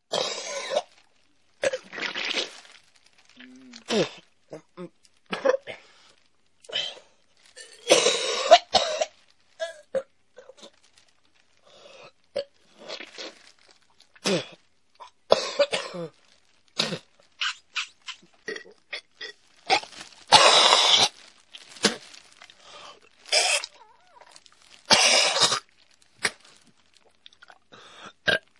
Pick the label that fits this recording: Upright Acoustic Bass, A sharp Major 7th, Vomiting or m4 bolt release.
Vomiting